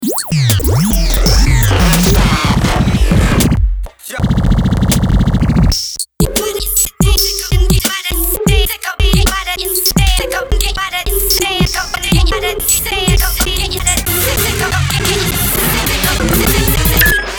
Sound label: jingle bass